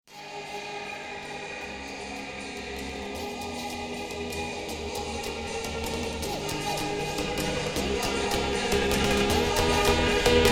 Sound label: Funk Speedup